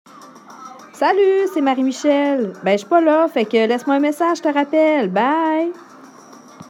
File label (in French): Message BV Musique